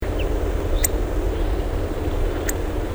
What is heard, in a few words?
Coccothraustes coccothraustes